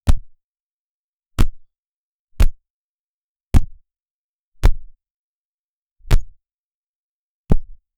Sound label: a quick jab followed by a softer impact noise